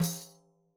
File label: daf Single hit; Raw Perc One Shots; Slate; SD SEISMIC Drum Sample Pack